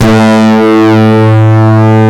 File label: D10, Synth Bass, LA Syn Bass